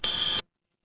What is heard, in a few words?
door doorbell